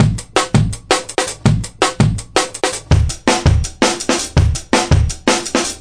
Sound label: 165 live two tone tek step, drum loop; 161 to 180 bpm